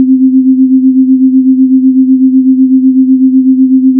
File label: beats